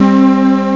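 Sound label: fifth lead; gmplay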